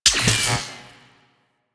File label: Rifle Secondary Mech; Warframe; Weapons; Wisp Rifle